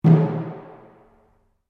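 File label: Drum Taiko Cylinder Rope forte, Japanese cylinder taiko; Miscellaneous Musical Sound Effects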